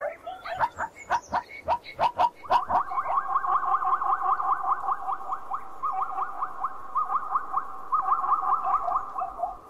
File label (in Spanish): cebra, Sonidos de animales